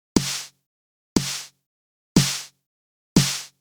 snare squash; waves comp

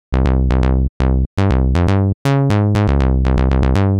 modular bassline; 使用滤波器; Moog Modular V的贝司声音; 使用滤波器的贝司声音

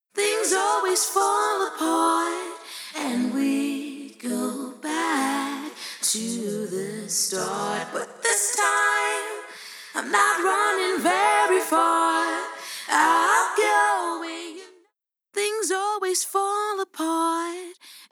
Vocal 3RDS HARMONY; Eventide H90 和声器; 终极人声效果配置：Eventide H90 和声器 与MixingLink

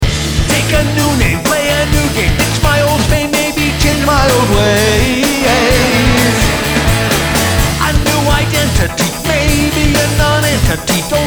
Plate voice; Abbey Road; waves

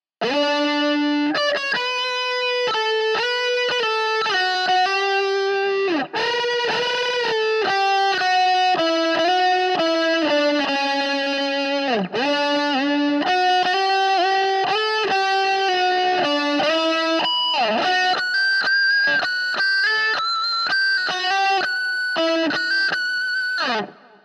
rhythm, gtr

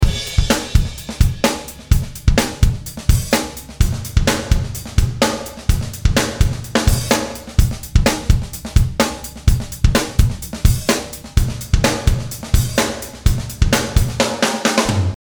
drum chamber; Abbey Road; waves

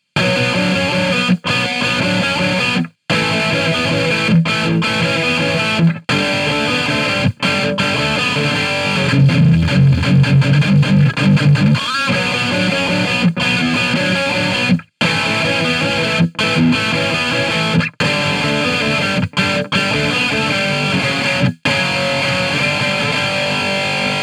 distortion, gtr

